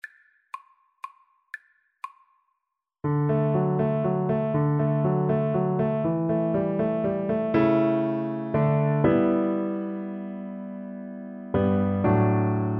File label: minuet, Cello; haydn; hob ix 8